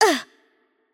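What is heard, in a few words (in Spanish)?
Hurt Light, Spanish; es ES; VO; bloom minigame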